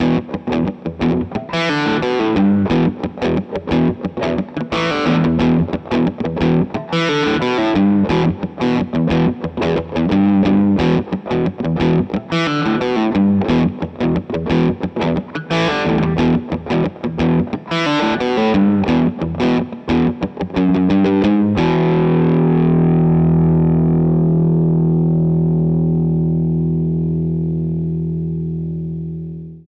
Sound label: orange 2x12 boutique; universal audio ox amp top box